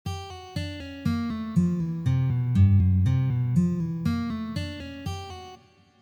Pull Off

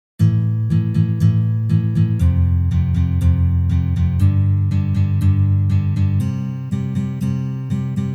Udary vverh Upstrokes

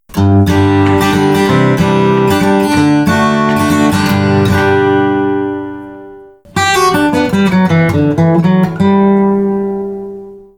Coconut Shell Guitar Picks; Kokosovyj oreh

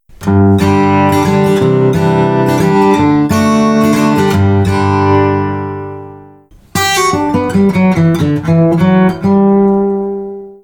Stone Guitar Picks Kamen

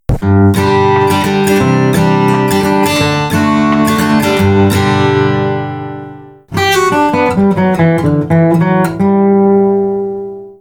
Sheesham Wood Picks Derevyannyj mediator

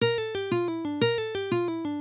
Pull off Pull Off

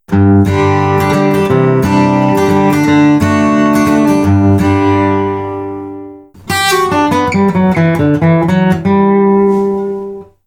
Dunlop Ultem Ultex Picks

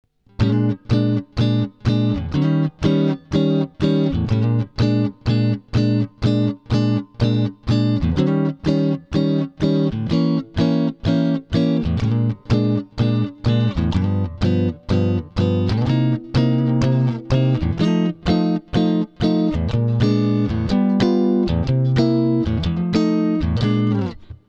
blues jazz

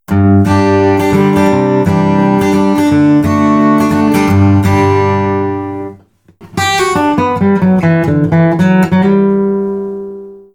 Blue Chip Picks sverhprochnyj plastik

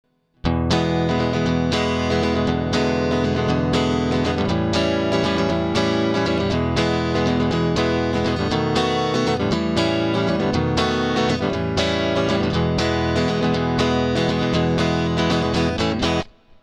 blues prog

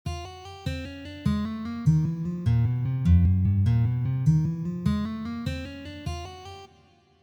Hammer On

